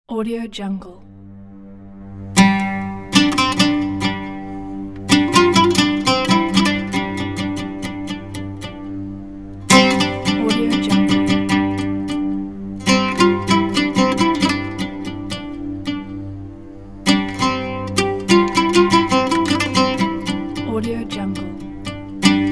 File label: middle east oud; for documentary